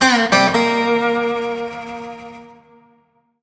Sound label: medium Win Riff; dead or alive 2